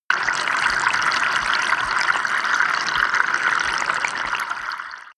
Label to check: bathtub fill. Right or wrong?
Right